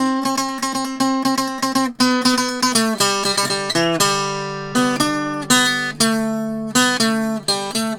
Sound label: Tenor Guitar, Appalachian